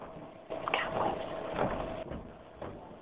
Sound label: EVP